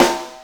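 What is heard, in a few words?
snare drum hit, roots reggae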